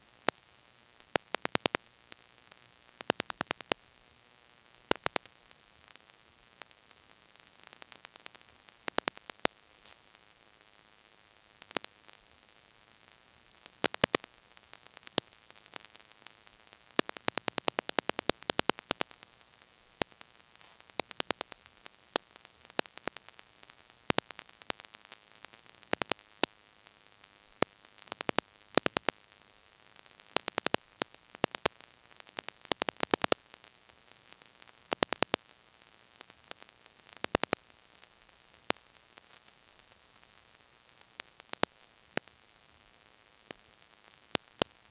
low level broadband hiss; noise

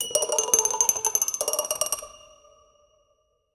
wooden cart wheels rollin, horse hooves

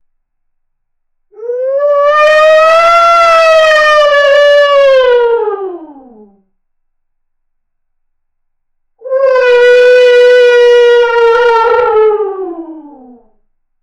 dinosaur dangerous low sc, prehistoric

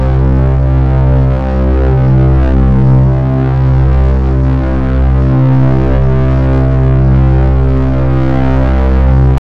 sounds neural network bui

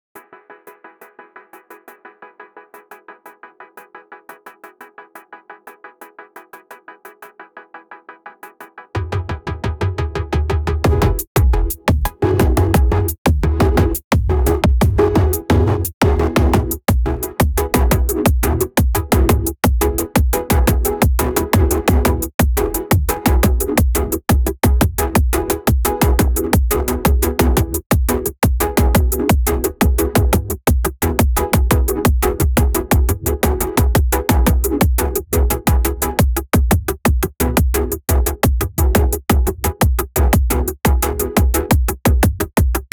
calm breakcoreeee

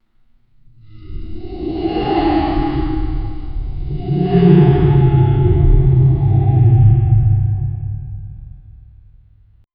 a loud deep intimidating, dragon

